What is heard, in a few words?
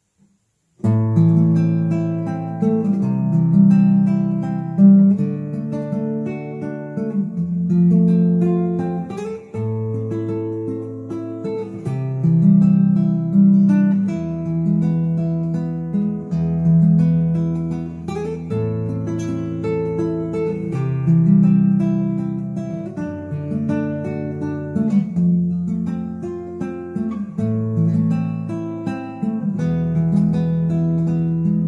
the house of the rising sun fingerstyle